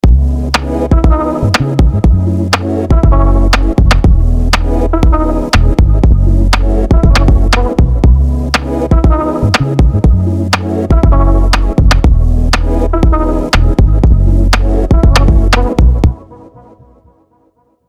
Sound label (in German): Song Reso Bassroom Mixroom und Limiter; NRG